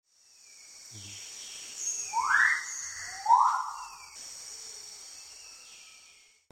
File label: Magnificent riflebird; پرنده تفنگمرغ باشکوه